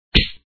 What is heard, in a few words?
Kick; Downing Street Fighter